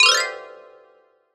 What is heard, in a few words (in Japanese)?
場面切り替え 木琴 上り; 場面切り替えや心理描写; 演出効果音